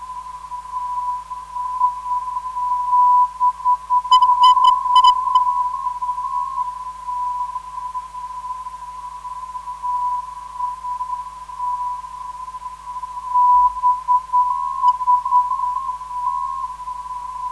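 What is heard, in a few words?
non-directional beacon; BS; 375.6kHz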